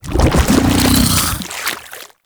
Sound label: Unburrow Goo; Warframe; Undazoa; Hunting Critters; Characters